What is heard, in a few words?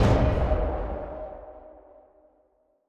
dracula stacked; netent